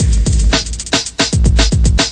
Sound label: 113 bpm oldskool electro roller, drum loops and breaks